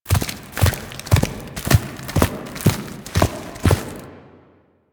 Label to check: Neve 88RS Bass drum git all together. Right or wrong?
Wrong